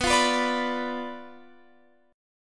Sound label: B Diminished B down Guitar Open F; Bdim strummed